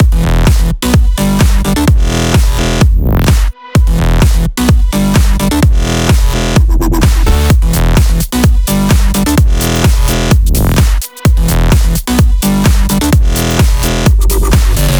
Renegade Mix, 128BPM; Vengeance Samples; Renegade Kit

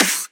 Claps & Snares

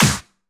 Snare; Vengeance Samples

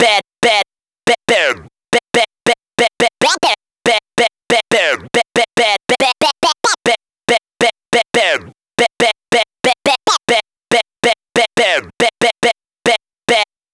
FX Vocal Guy Vocal Vers2 DRY, 140 BPM; Vengeance Samples; VTDS2 Song Kits; FX Vocal Candy Guy

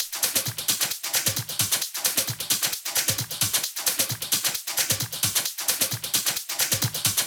132BPM Elemental Kit

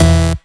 Synth Shot; Vengeance Samples

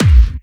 Bassdrums Clubby Kicks; Vengeance Samples

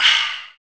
Chant

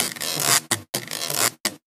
Percussive Loops 128BPM; Vengeance Samples

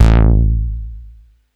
Bass Juno A; Vengeance Samples

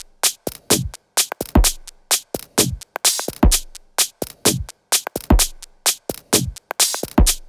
128BPM Kit; VFH1 128BPM Roboto Kit

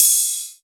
Ride, Cymbals; Vengeance Samples

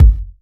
Bassdrums

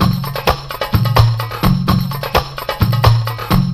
Latin House Loop 128 BPM; Vengeance Samples

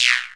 Percussion; Vengeance Samples; VEH2

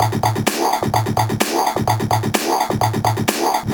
Minimal Loops

